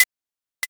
stopwatch

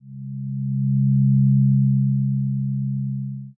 zero g

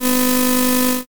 shredder

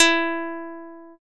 guitar

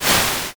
swoosh

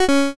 judge miss

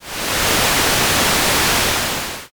waterfall

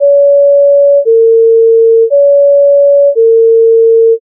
ambulance

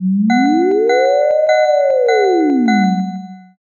firetruck

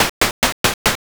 bird flap

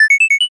sparkle

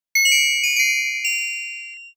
wind chime far

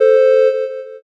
horn

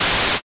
mosaic

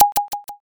rimshot

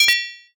sword clash

